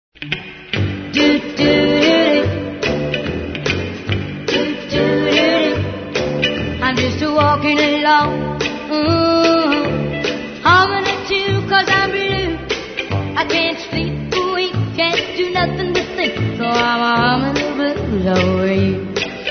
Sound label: hummin the blues